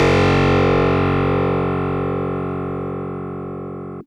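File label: Fuzz Clav DX100 F#0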